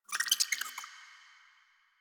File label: Rat Pet Squeak